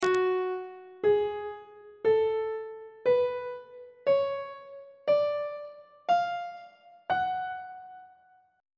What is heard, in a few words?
g flat harmonic minor scale note positions on treble clef